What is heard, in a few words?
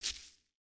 Foot Grass Walk, footsteps